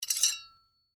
Sai Pull Apart, Warfare, Sai, Pull, Apart, Handle, Metal; Sword; Combat; Swords; BlastWave